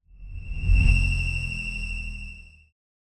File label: whistle med R to L, Cinematic Swoosh; 3maze